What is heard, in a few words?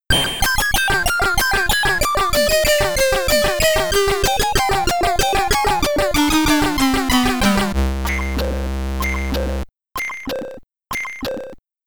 Game Over (SID Stereo)